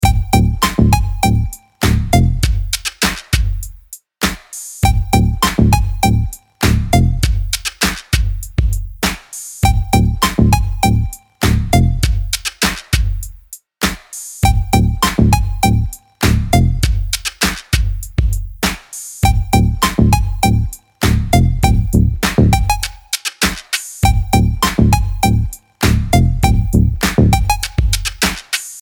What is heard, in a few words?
Funk; LatinNeo Soul & hip hop